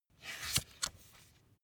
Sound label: magazine flip back